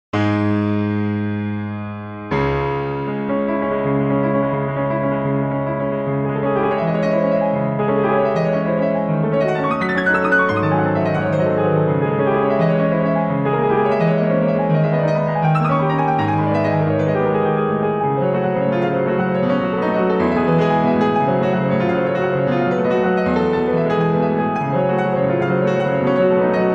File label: Piano; supremepiano; PianoOne; VST Instruments